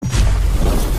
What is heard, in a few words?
pubg game start